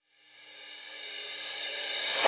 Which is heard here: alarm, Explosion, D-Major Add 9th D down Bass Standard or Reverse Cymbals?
Reverse Cymbals